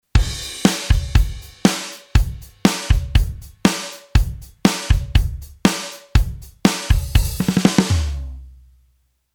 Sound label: Rhythm Kit Metal